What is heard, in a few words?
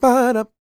SING, Vocal Planet R&B & Dance; ILIO